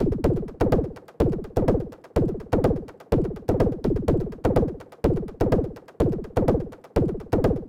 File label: 909 Tom loop